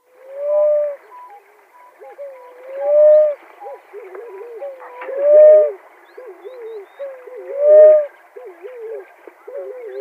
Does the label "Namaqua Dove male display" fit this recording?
Yes